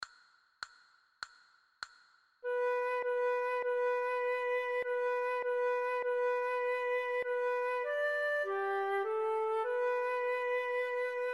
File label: jingle bells, flute a bec